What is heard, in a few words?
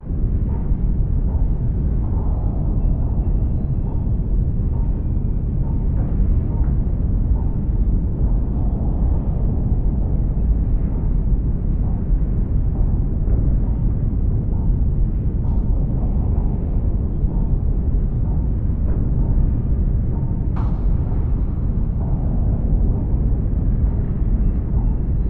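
Ambience design Otherworldly Gloomy Industrial Ambience Em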